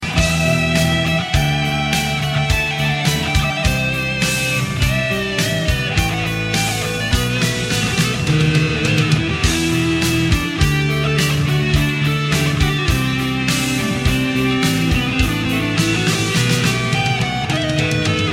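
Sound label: Guitar Riff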